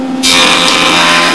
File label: planer